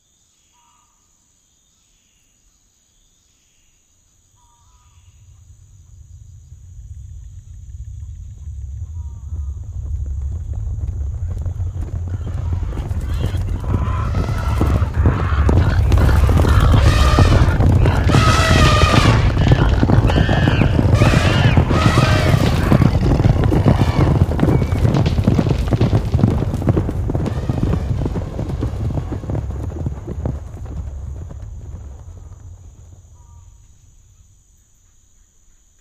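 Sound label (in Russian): лес дневные звуки табун слонов кабанов пробегают мимо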